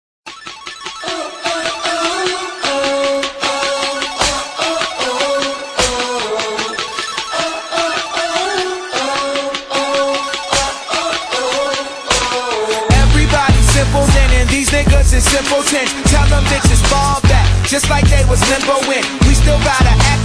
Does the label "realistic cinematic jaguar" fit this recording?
No